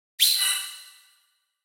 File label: Card Token Summon